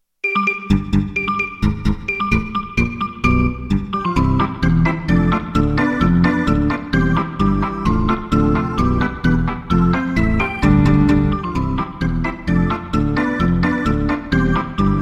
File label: polka